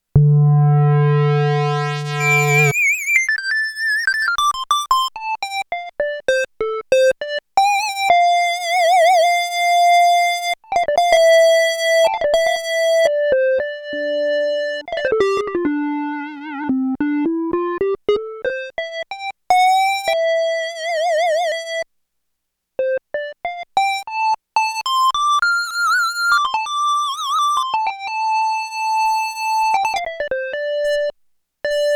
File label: Square Lead, Roland JX-8P